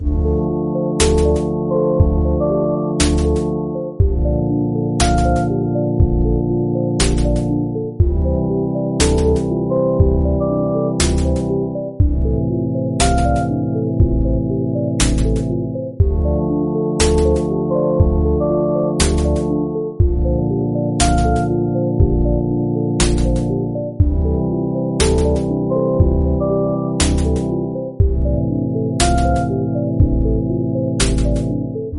stone tower loop

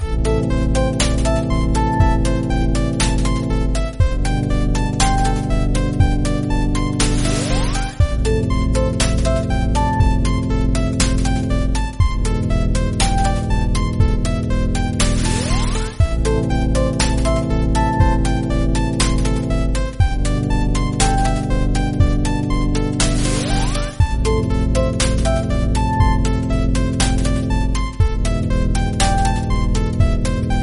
boss altar clash loop

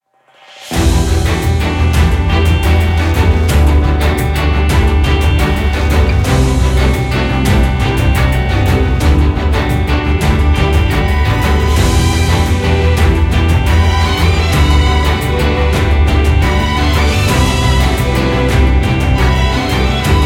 turn Bgm

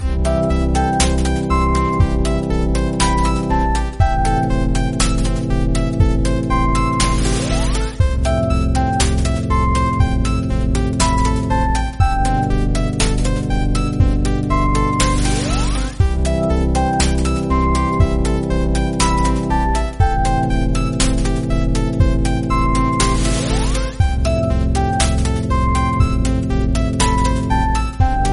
boss final soul loop

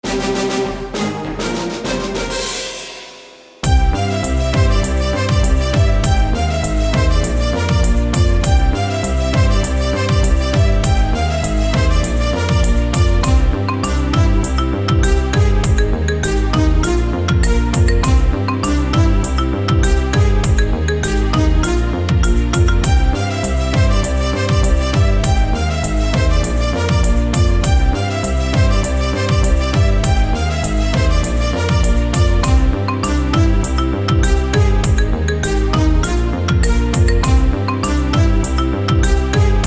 VICTORY

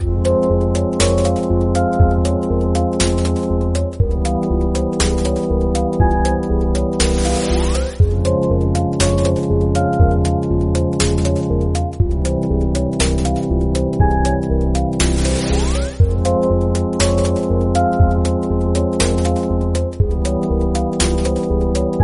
shadow maze loop

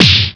die

